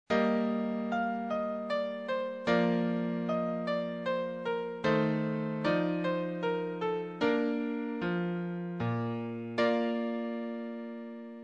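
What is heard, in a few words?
Alto Minuet Acc, Alto Sax; Alto Sax Solo; Acc. Slow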